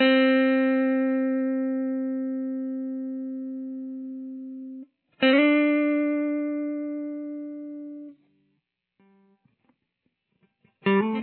intro lead clean, guitar; Here is the 1st guitar

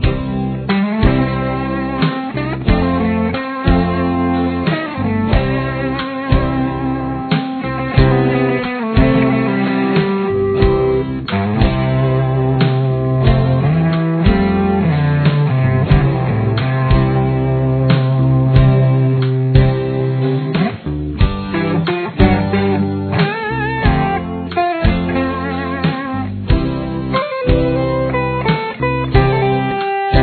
c solo

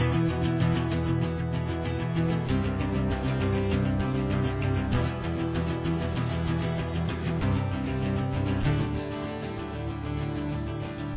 chorus, guitar

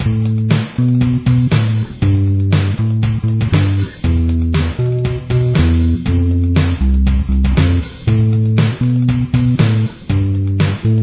bass

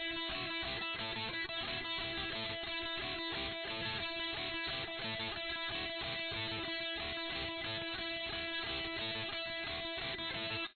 verse guitar

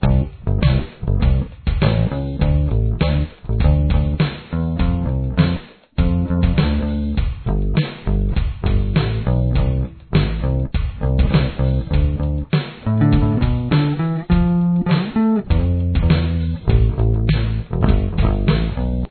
chorus bass

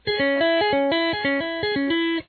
tapping, guitar; It involves hammering on the fretboa; Tapping is the technique Eddie Van Halen made famous.

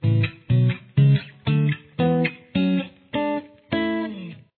A Major Chord Progression, guitar

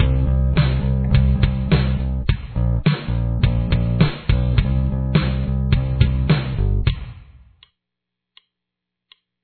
intro bass

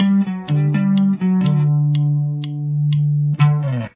detail, guitar

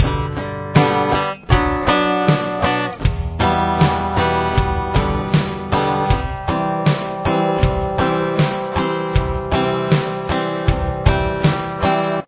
intro guitar

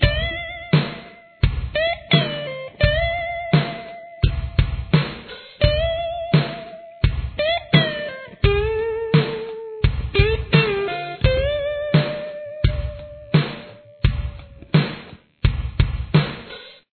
solo

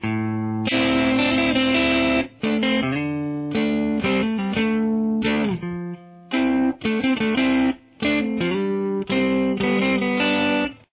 intro lead distortion, guitar

